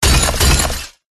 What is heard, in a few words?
impact hit hard broken glass short slam; zapsplat